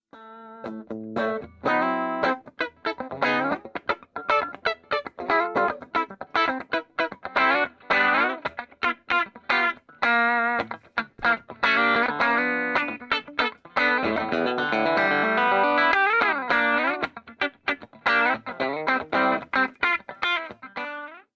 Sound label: lion champster p90